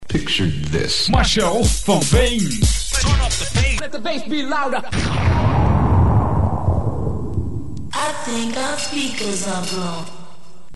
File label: Bass Jingle